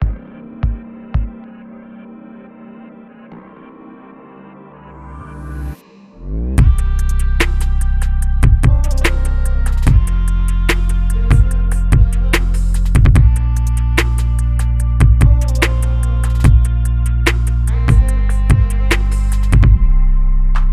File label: smooth beat